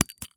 Ramune Bottle, open, marble, punch, decompress, ping, glass; Eiravaein Works; Vaeyan I